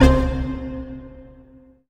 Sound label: Synth Sound; Vengeance Samples; VEE Synth Shots